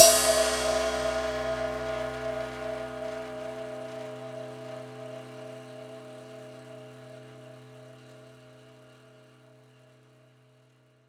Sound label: Prepared Drums